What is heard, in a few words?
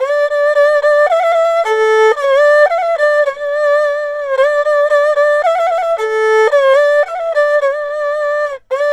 ERHU 110 G; INIS - Opium